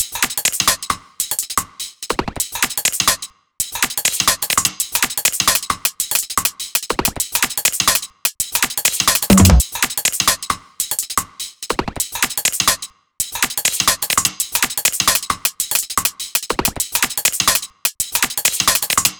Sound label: 200Bpm Future Garage Drum Loop; M8; percussion and foley